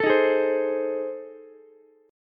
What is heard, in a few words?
A Minor Major 7th Flat 5th A, Ukulele; AmM7b5 strummed